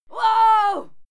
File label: female fight scream; schreie; menschen